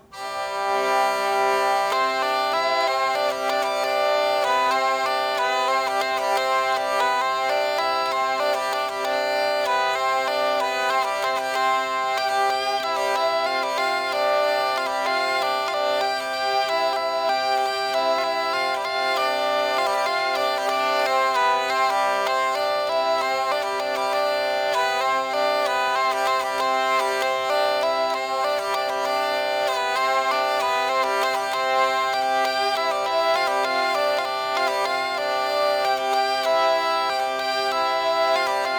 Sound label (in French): polka; pere machin